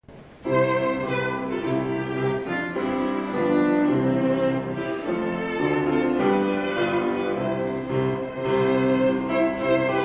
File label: Prelude, Violin